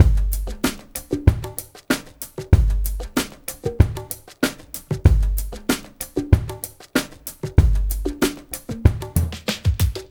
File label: ABO MIX, Liquid Grooves; Spectrasonics